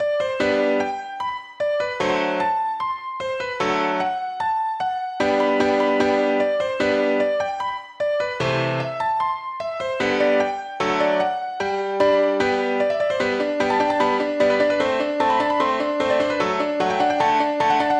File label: Magic Flute